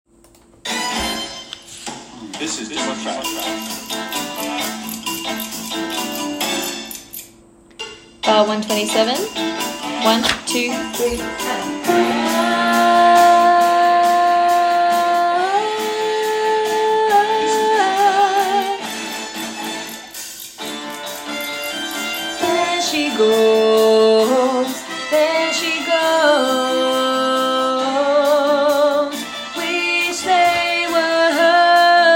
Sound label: Bass; Fame The Musical - Holiday Pack